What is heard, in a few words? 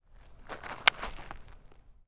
hit, Ball Bat Glove, baseball